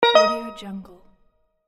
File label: tropical ding